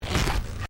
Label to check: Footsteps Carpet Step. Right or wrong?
Right